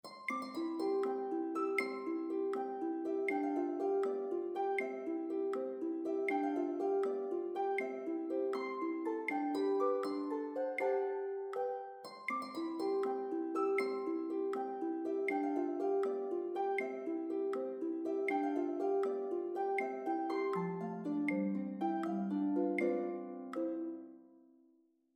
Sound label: audio click